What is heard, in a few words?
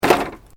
Metal Light Movement Minor